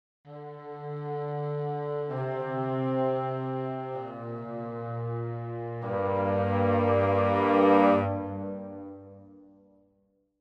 Traditional Low Ensemble Long Sustain; VENTO

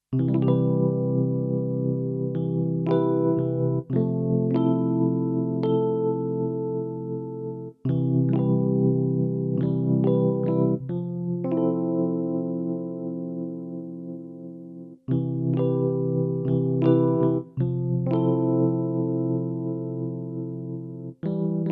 Charlie Lab Digitar

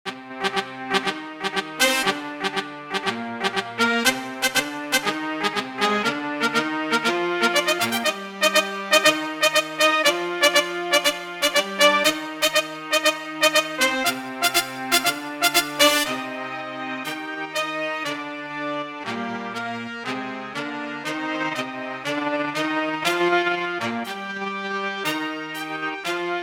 JunoDS88 X Brass Sect

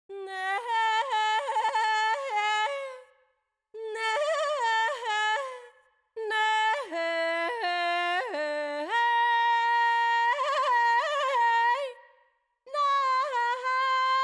Ethno Phrases

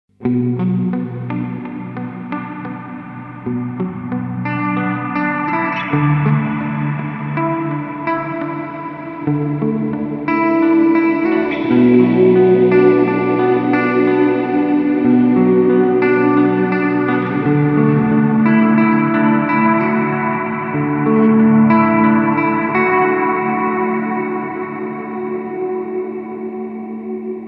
Curved Space; Meris LVX Modular Delay System

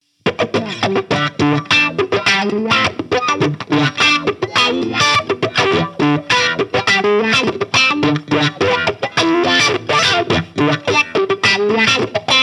Lead Wah Boost Light Crunch; Morley MTLW

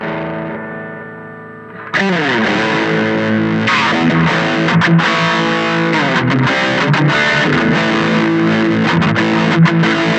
High Gain

